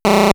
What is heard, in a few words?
error